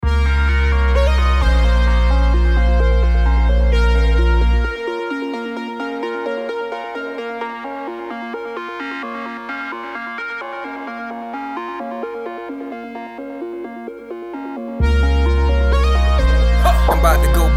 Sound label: RADIO VERSION